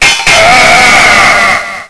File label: Weapon Sound; Sir Richard The Lion Hearted